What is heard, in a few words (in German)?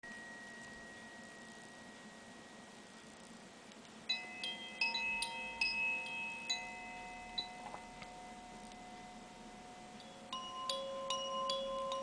klangspiel, Klangschalen; Klangschalenmassage; Klangtherapie